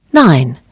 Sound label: key